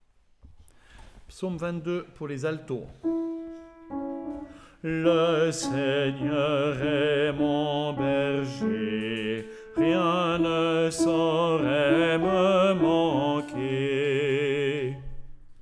Chants Psaume 22

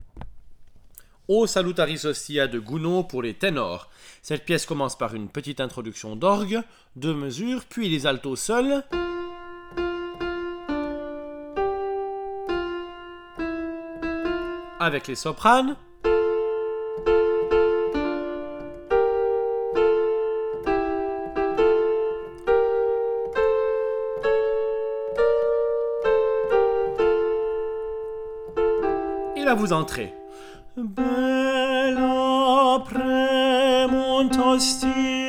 Chants O Salutaris Hostia T

